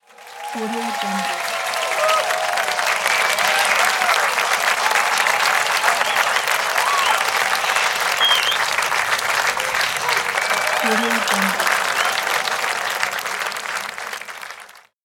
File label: applause cheers